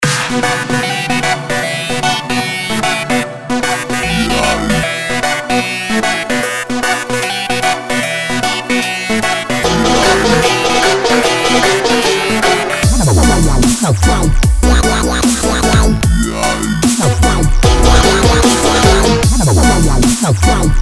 Abnormal Dubstep

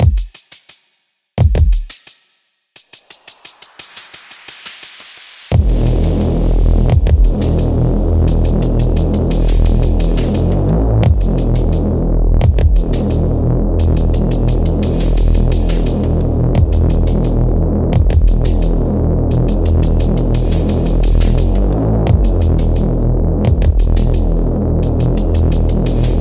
Drum and Bass

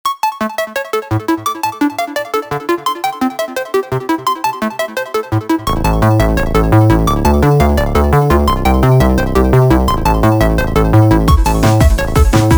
Arp

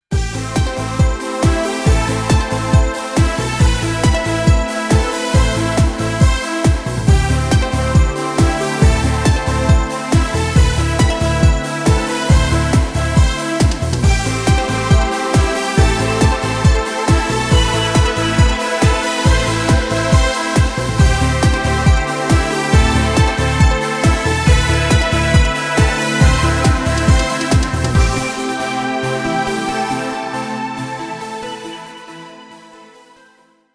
Happy Dance

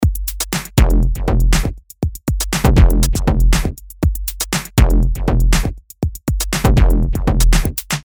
Breaks

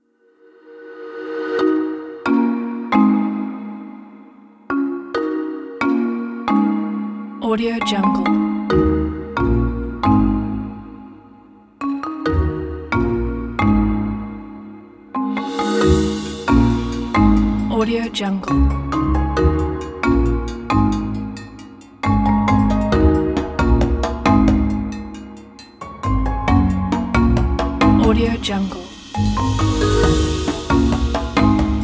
Nature Marimba; audiojungle

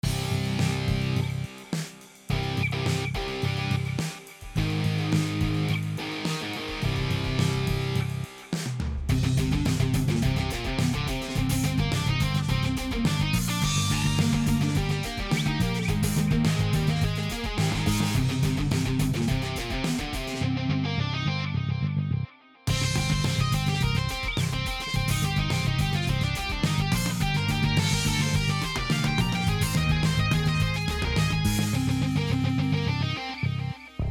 Rock-Metal Instrumental

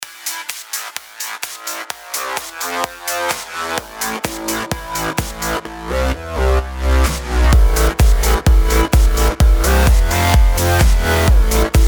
Ringtone

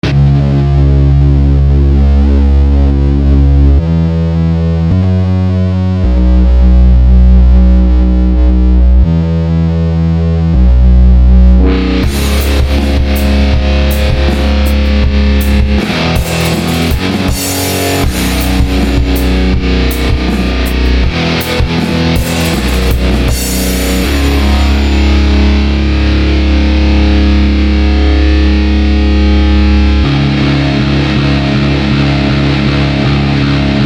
Fuzz stoner

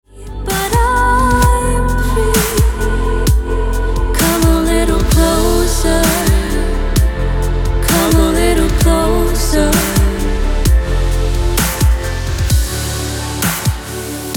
Pop

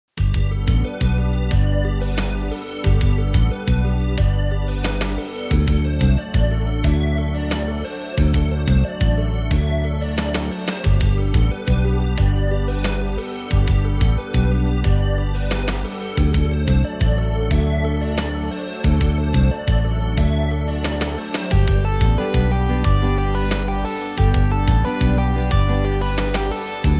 High Spirit, Background Music

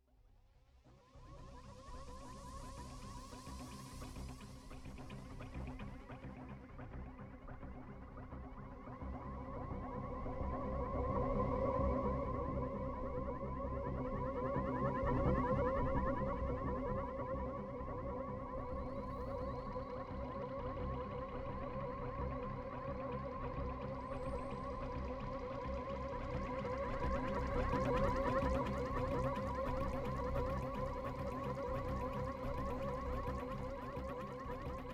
Forward in Space Yamaha SY99 SFX Ambient; Alexy.Nov; MadAmbient

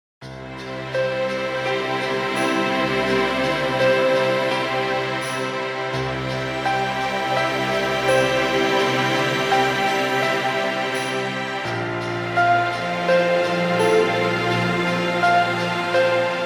Ambient Cinematic Relax Music

